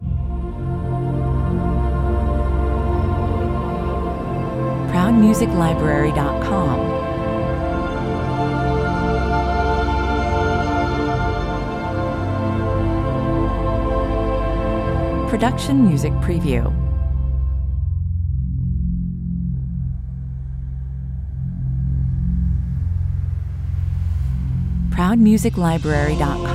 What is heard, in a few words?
choral opener 65 bpm; short